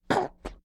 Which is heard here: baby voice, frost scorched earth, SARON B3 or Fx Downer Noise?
baby voice